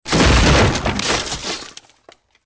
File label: wood building; Soldier Of Fortune II Double Helix; XBOX